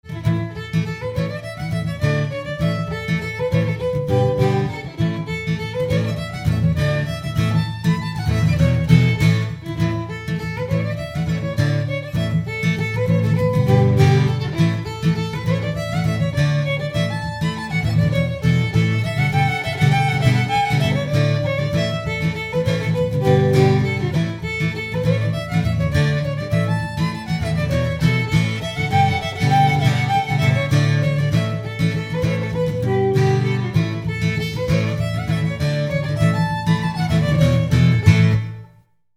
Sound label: Fiddle, Classical String Quartet; Red-Haired Boy